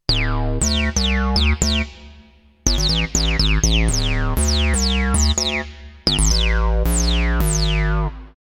Acid Bass

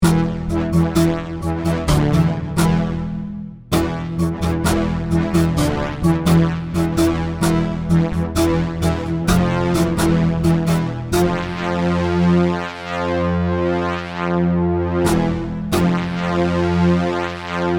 Omnisphere Bow Mix

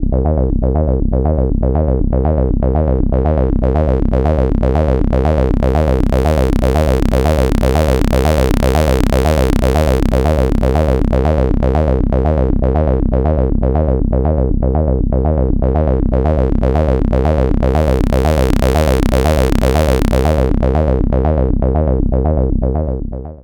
Filter 0 24 dB

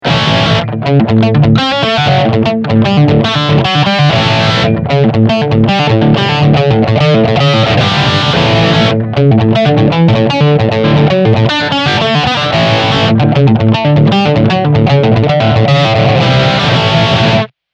OD1 + Stack

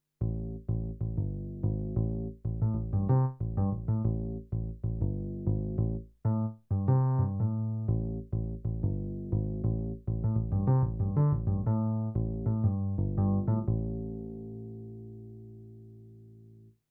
K150 bass

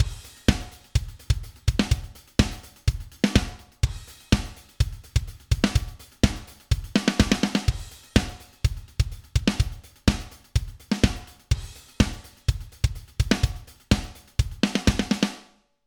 Drums Groove 126 Rock Straight; Steven Slate Drums 5

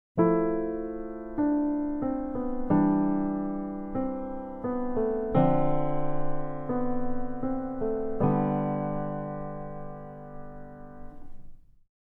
Piano

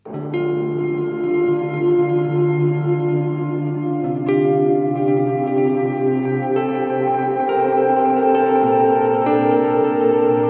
Ambient Soundscape guitar; Hologram Electronics Microcosm

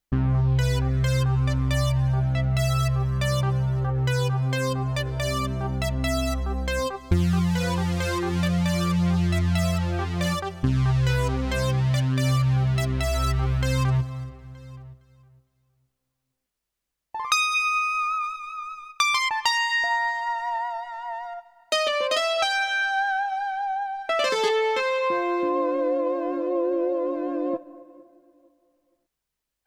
Synth

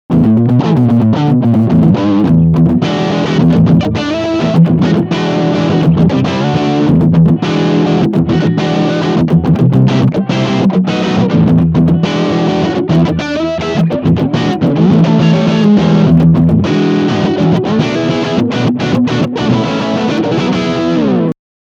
W Screamer

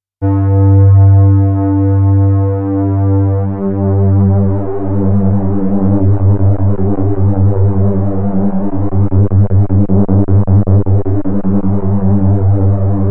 4 VCOs PW Modulation Tri LFO

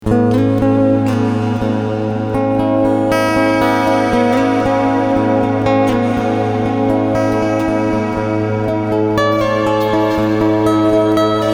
Plate

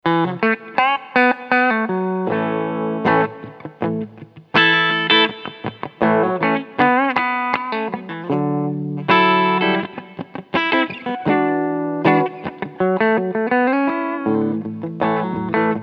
Lean Licks

